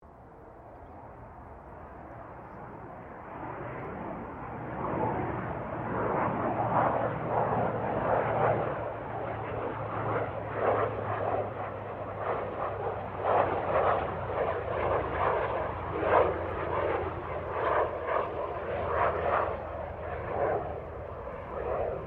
AIRCRAFT GLOSTER METEOR F MK8 PASS PA STEREO, figter jet; Airplanes